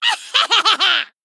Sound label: Female Gnome Attack